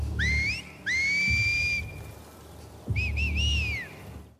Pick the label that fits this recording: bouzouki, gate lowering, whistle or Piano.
whistle